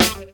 SD GTR; E-Lab; X Static Goldmine 2; akai

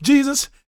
GOSP MALE, Vocal Planet Gospel; Spectrasonics; Vocal Planet Vol.1 Gospel; akai

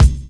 BDRUM; E-Lab; X Static Goldmine 2; akai

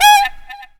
SAX RIF; E-Lab; X Static Goldmine 2; akai

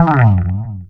BASS FX; E-Lab; X Static Goldmine 2; akai

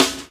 SD W FX, SNARES; E-Lab; X Static Goldmine 2; akai

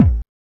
HSE BD

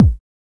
HSE BD

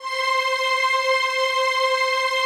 PAD; Best Service; XX Large; Pads

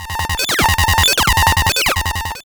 SYN-FX

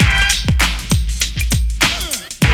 BREAK

